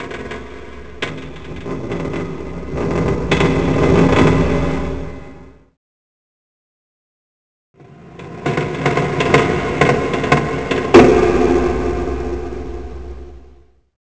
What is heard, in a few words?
fact CREAK; The Elder Scrolls III Morrowind GOTY; XBOX